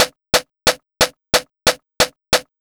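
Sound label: drums, Pure Electro; USB Soundscan vol.03; AKAI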